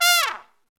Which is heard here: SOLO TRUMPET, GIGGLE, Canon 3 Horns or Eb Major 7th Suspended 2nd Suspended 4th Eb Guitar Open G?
SOLO TRUMPET